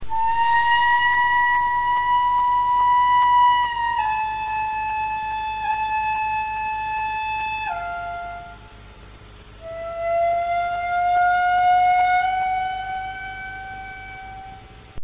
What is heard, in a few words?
ryuteki